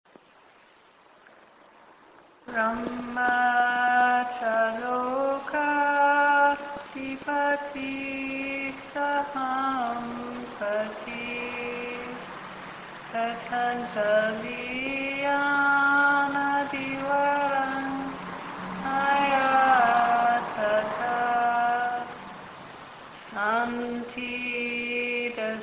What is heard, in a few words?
chants the traditional request for a Dhamma teaching